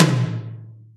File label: Mid Power Tom, Alesis HR16B